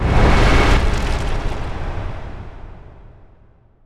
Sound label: firestorm cast; underlord